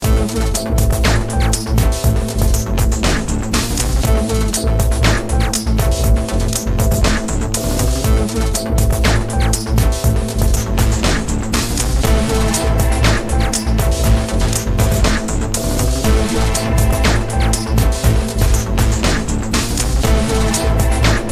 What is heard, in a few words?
instrumental ringtone